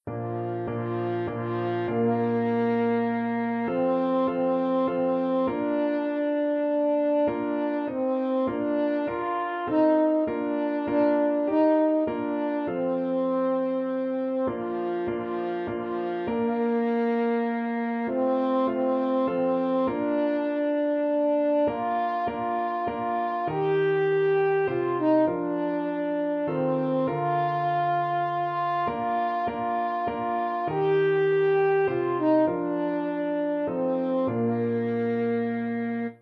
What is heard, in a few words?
french horn